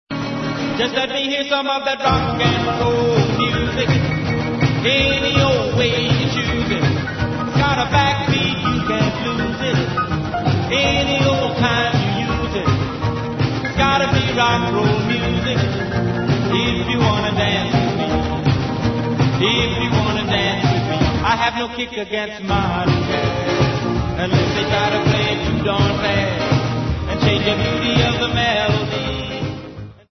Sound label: rock and roll music